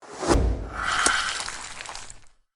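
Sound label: ice arrow impact with freeze; SFX; DailySounds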